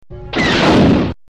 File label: Blaster; Star Wars